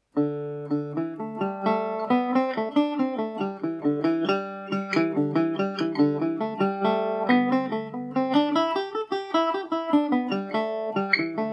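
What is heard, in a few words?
A dorian, Irish mandolin; nightingale revisited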